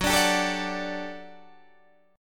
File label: G Major 7th Suspended 2nd Gb